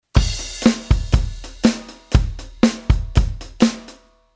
Quantize Rand; Midi Basic

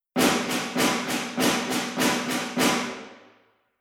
snare on

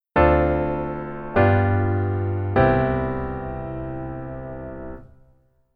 keyboard voicing low

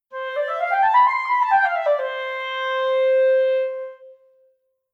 scale

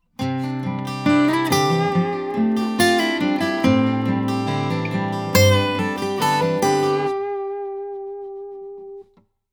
guitar Lead

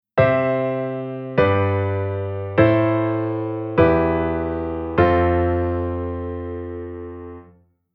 Chord Sec Dominant Pattern

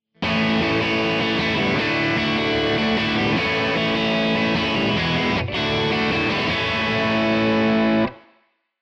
guitar low chord

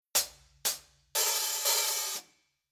Shuffle HH, Rhythm

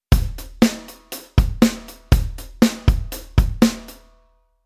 Rhythm 8 beat 2 bar

